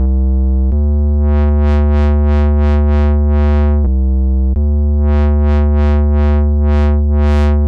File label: Hard Curve